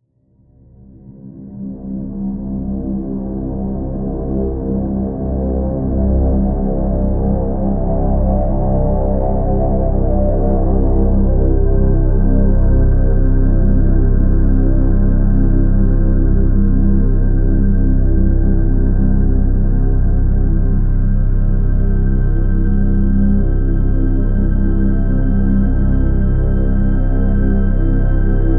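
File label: drone C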